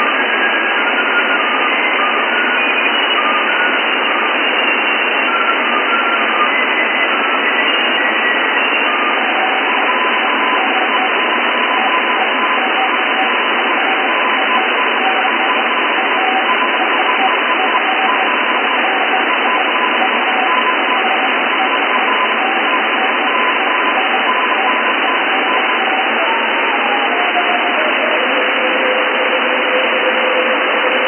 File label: wide BW; 10ghz